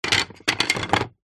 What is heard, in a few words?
leisure toy train wooden track pieces several place down in plastic box; zapsplat